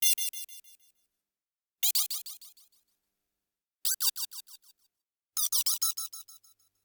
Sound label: Arcade Click Neutral; boom library; The Interface